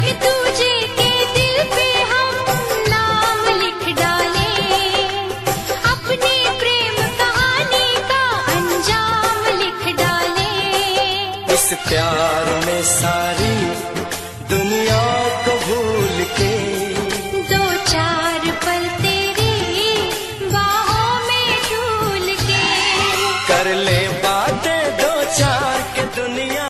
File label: love romantic ringtone, bollywood ringtones